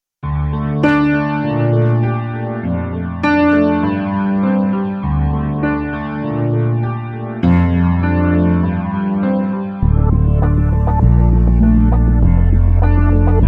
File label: Brass; Pure Ambient Music